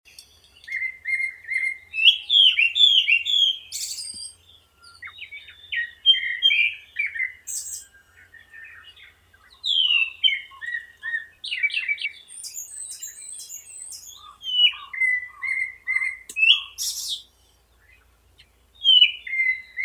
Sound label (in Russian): drozd belobruhii turdus cardis